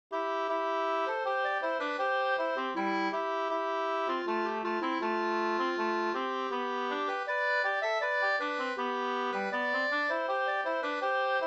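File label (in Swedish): brudmarsch Leksand 2 stämmor; Kers Lars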